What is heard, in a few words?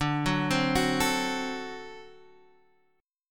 D 7th, down, Guitar, Open F; arpeggiated